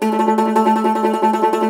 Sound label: Cumbus SAZ A, Plucked Strings